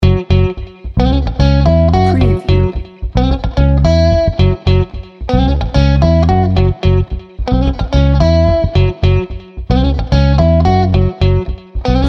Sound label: funky stumble no drums